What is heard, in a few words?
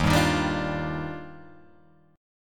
D sharp Major 9th, Guitar Open G